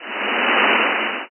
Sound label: curtain up